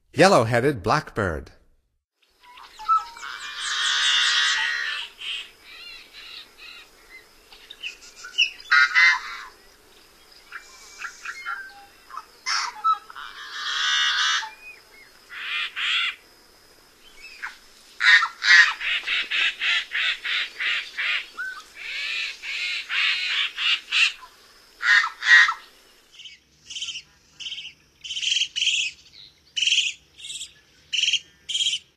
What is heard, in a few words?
Yellow-headed Blackbird, Songs of Birds of North America; Stokes